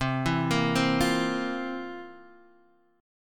C 11th C down Guitar Half Step Down

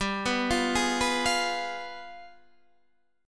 G 7th Flat 9th, Guitar, Open D

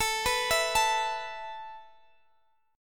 A Suspended 2nd down Guitar Open G

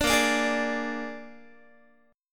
Bb 7th Bb down Guitar; Bb7 strummed

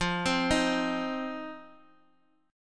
F 5th 7th F, chord; F57 arpeggiated

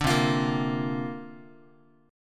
C 7th Suspended 2nd Suspended 4th C down Guitar; C7sus2sus4 strummed

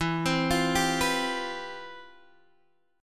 C Major 11th E

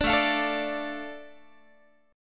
A Suspended 4th Sharp 5th, A, Guitar; Asus4#5; strummed

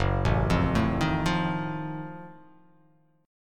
Gb Minor Major 13th, GbmM13 chord; arpeggiated; JGuitar

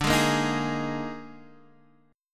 Eb Major 7th Suspended 4th D

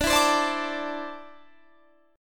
D 7th Suspended 2nd down Guitar Standard; D7sus2 strummed

